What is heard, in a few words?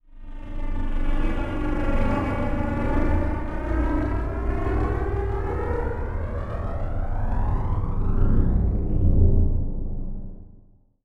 Transition Stab Dark Medium; Production Element